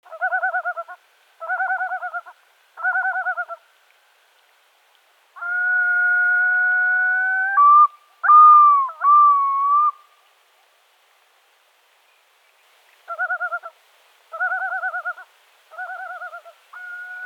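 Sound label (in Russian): beloklyuvaya gagara golos, yellow-billed loon voice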